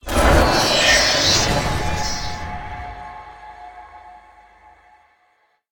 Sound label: magic spells cast long combo dark; sonniss gdc